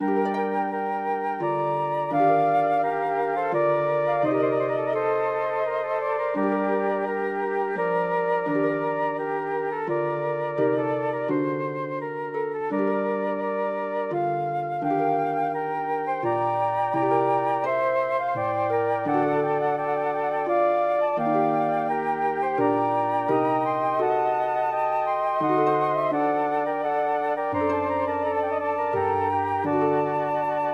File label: for flutes harp; purcell henry; charon the peaceful shade invites